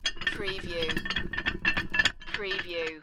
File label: Heavy Chain Rattling On Winch Short